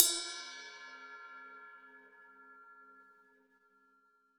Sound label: RAW RIDE, Drums Acoustic; AKAI; 90s sample CD; USB Soundscan vol.10; RAW KIT 9